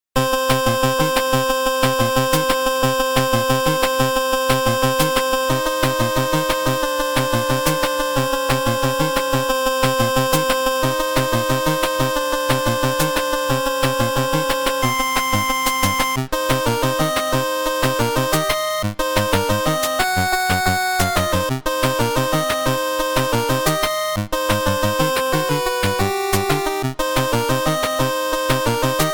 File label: 8bit Ver; Vampire